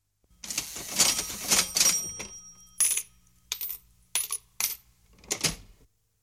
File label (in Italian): REGISTRATORE DI CASSA SPICCIOLI